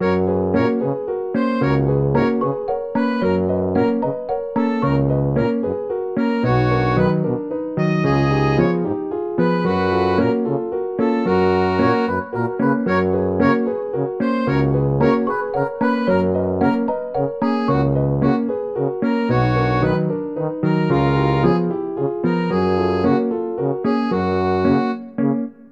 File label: Generic Minigame; peppa pig world adventures